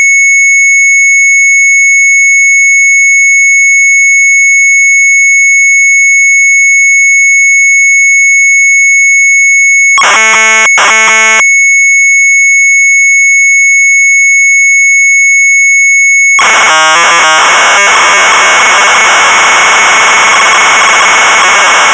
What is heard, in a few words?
MZ800 Tape; mz800 wav; ALIEN SHOOT; Sharp